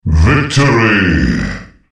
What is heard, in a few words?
victory